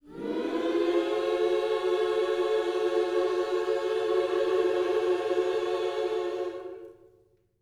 MM MINOR, Gospel

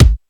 BOUNCE KIK, 12-Bit Drums; E-MU Formula 4000 Series Vol. 1; Hip Hop Nation

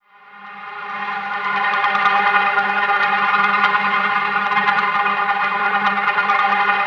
irritant, bizarre guitar; Spectrasonics

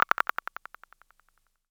Delayed click, Univox SR-95

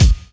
BD, Dance Mega Drums, HIP

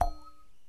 JEW'S HARP

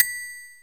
ring, Roland R-8

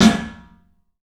CLUB SNARE; 3D Sound; 3D Drums

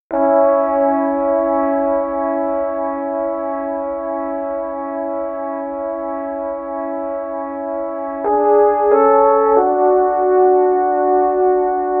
Horns; Sonic Foundry (Sony Creative Software); Cinematic Funk Urban Tone Poems

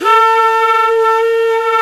flute vox, singin' flute; Jazz; Roland L-CDX-03 Disk 1; Jazz+Singin'

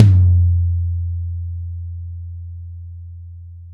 NC14TMDMSL, drums toms dry; Real Mega Drums

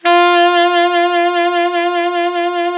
ALT SAX, D3; Best Service; Alpine Volksmusik

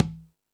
Latin Drums, E-MU Pro-Cussion

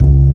Jaz Bass; Equipped Music

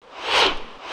SWISH, percussive

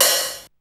626 CL HH; Hollywood Edge; The Big Bad Beatmachine; TR-626

